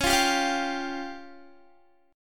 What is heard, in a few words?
Db Major Flat 5th Db down Guitar; strummed